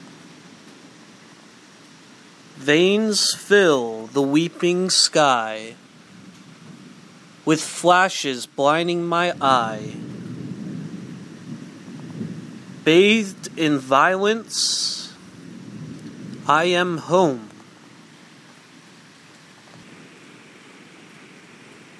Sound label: lightning storm